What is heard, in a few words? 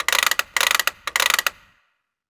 gun toys; USB Soundscan vol.27